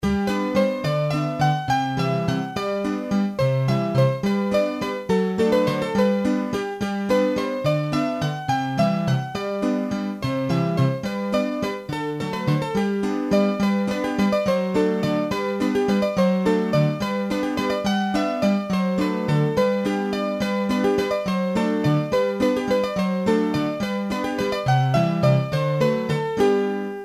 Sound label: Valse, hurdy-gurdy; Valse de Vertoujit; trad